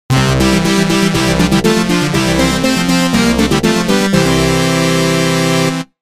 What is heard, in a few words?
''Success'' (Stage Clear); Data East Retro Game Music Collection 3